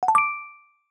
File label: simple celebration; Google; Material Design sounds; Hero Sounds